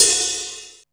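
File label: HIHAT OPEN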